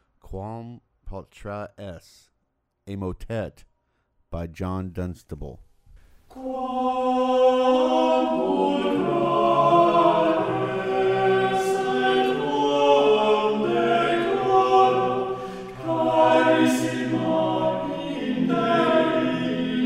motet; John Dunstable; Quam pulchra es

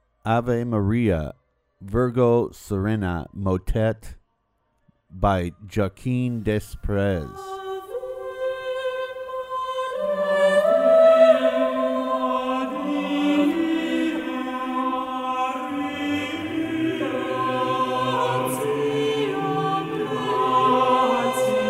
motet; Josquin des Prez; Ave Maria virgo serena

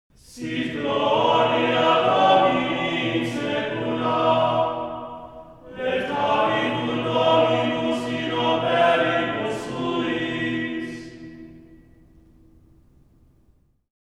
sit gloria domini

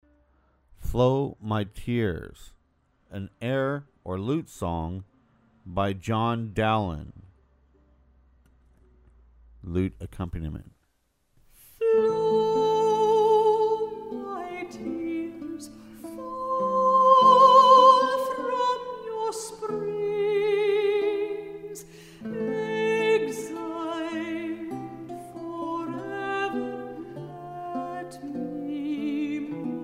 air or lute song; John Dowland; Flow my tears

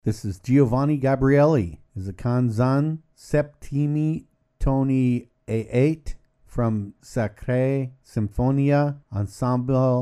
ensemble canzona; Giovanni Gabrieli; Canzon septimi toni a 8; Sacrae symphoniae

